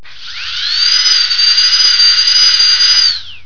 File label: electric drill